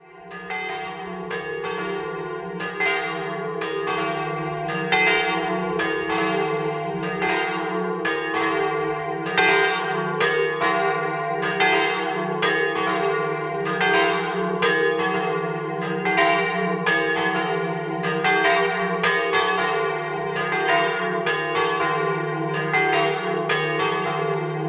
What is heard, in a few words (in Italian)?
campane; Fagagna Pieve